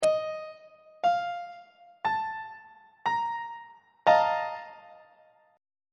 b flat major 7th suspended 4th 1st inversion on treble clef